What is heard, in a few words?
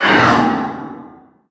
vox atk